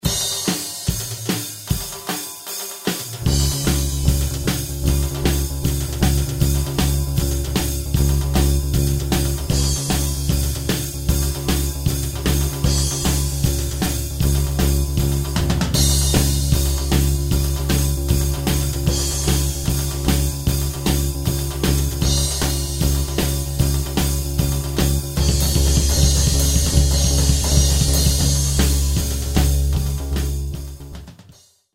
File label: Backing Track